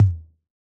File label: Tom, Yamaha DJX 2B